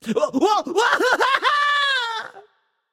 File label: nv fear; smite; voicepack; ganesha